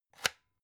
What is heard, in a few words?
electric whisk slider open hard; haus-garten; kueche